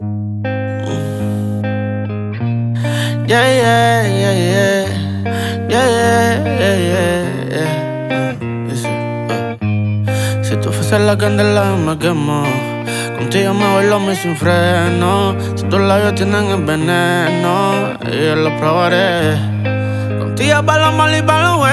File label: mix roma acoustic